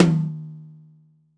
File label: Brush Tom